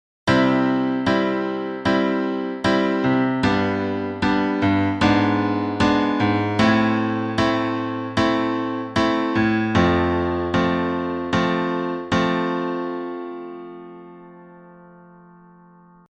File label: triad dim